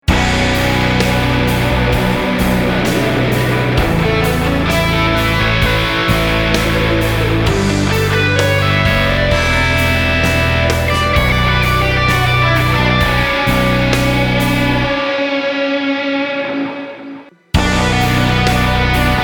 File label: Guitar Solos; To Summon Satan